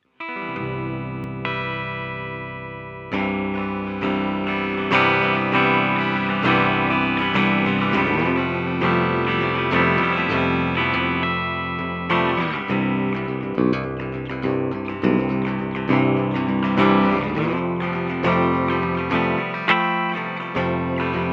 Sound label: clean; Pegasus 7